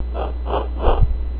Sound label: flap